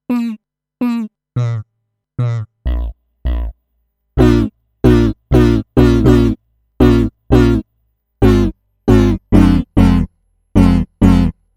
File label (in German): Resynthese Stimme, FM Synth